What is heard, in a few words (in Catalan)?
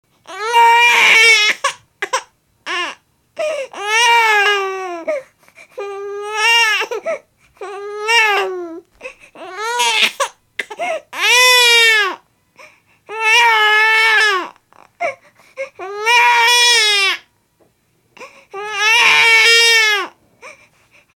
nado plorant